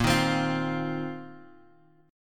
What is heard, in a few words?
Bb Suspended 2nd Suspended 4th, Bass Standard; strummed